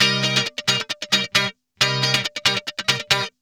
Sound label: GUITARS 135